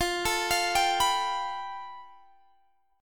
A 7th Suspended 2nd Sharp 5th

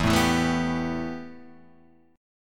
A 7th Suspended 2nd, E

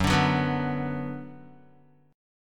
Gb Suspended 4th Sharp 5th Gb Guitar; strummed; JGuitar

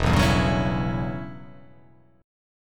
E Minor 6th Add 9th chord

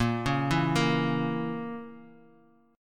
Bb Diminished Bb Guitar; Bbdim arpeggiated; JGuitar

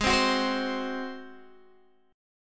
Ab 7th Ab down Guitar DADGAD

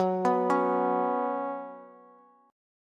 Gb Suspended 4th Gb, down, Bass; arpeggiated; JGuitar

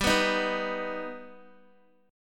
G Augmented G down Guitar; strummed; 4F,4A,5C,5G,5C,6E

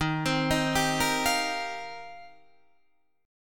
D sharp 6th Add 9th, Guitar, Open D

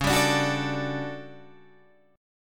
A 13th C sharp, guitar chord

